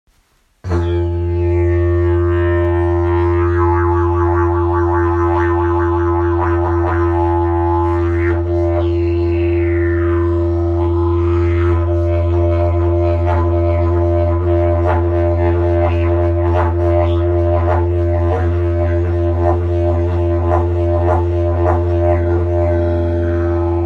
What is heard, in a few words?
didgeridoo; jilm lezevzem